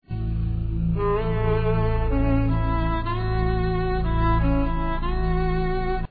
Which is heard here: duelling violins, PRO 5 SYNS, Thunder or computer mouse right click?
duelling violins